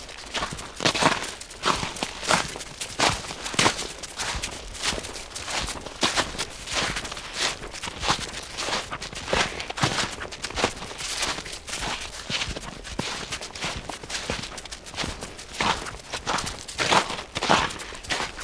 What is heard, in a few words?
walking through the snow; OpenValley